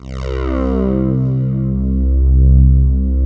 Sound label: PULSATN, PULSE; PULSENSE